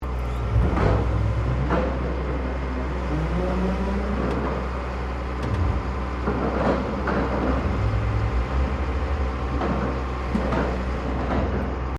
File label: Construction Drill Maneouvering; Various construction machinery